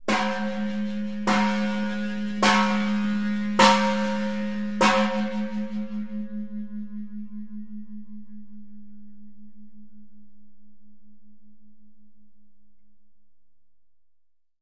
Khmer Temple Bell, bell towers

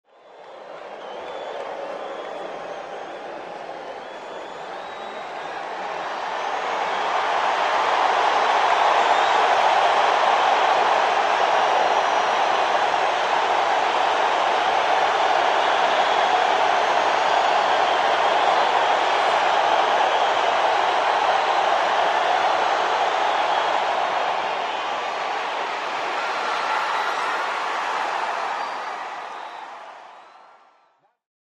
Sports Crowd Big, large

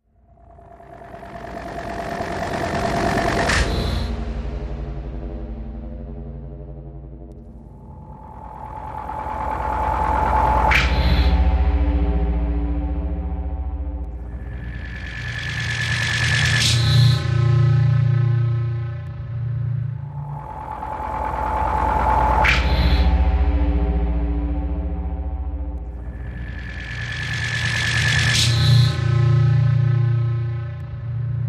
Low Electro, energy beams

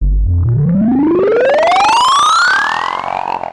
synth efx rise

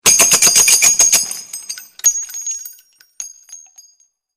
Machine Gun Burst Shell, eject shell

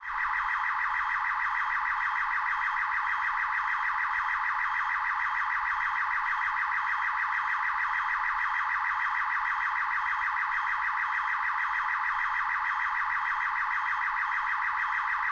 Alarm Shop Store Burgla

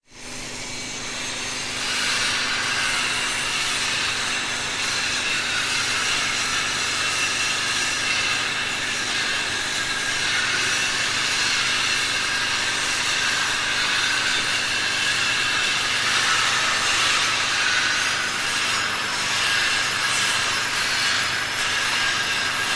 Industrial Amb Factory

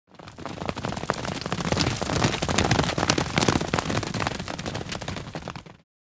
By 6 Horses Up Past At

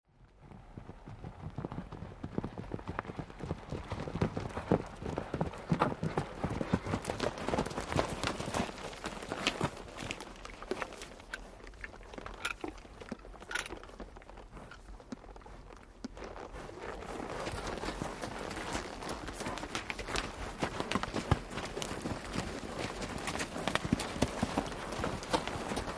Group Horses Trottin

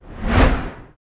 Car Oncoming By Whoo

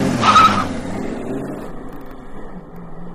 Mustang Peel Accel Sh

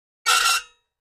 Metal Scrape On Metal

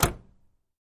Camaro Door Close, chevy camaro

